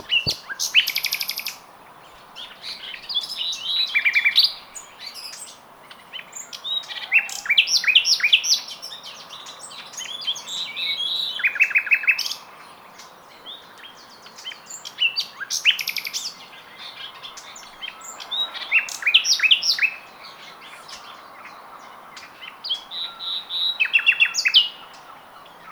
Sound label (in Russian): yuzhnyy solovey luscinia megarhynchos, zvuki peniya ptits